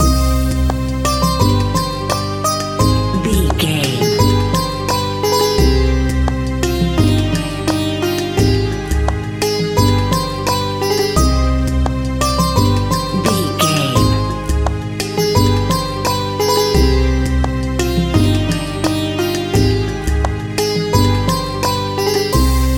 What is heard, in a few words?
indian market music, An exotic and colorful piece of World music. Groovy, powerful, exotic and wild.